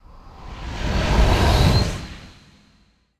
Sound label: Dark Wind Growls; Dark Wind Growls 03